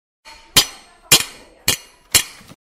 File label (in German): haemmern auf metall